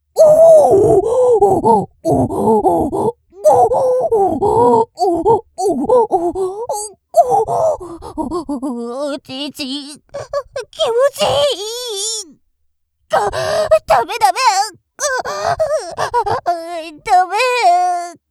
おほぉっ！♥おっ！♥おっ！♥おっ！♥

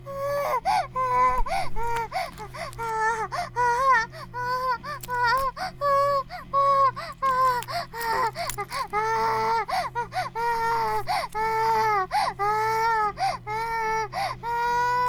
バイブ放置; イクたびにわけて

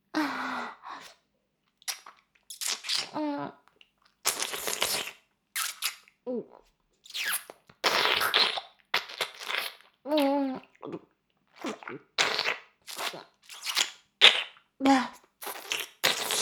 フェラ音声; 牧場戦腺; 少尉

